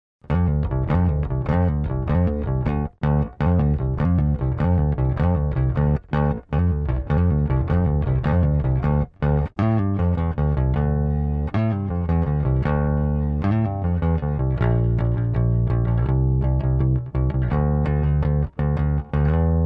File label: EBS heavy